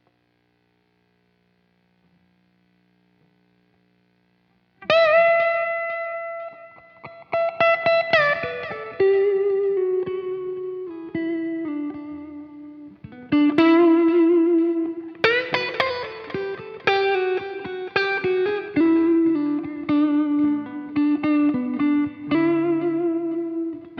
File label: guitar; admiralbob77